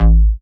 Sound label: Bass Cuts; Vengeance Samples; VES1 Instruments